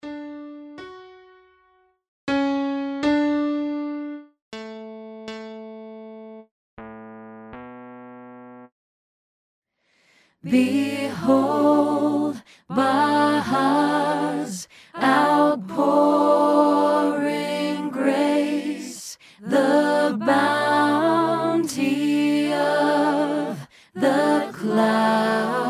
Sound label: alto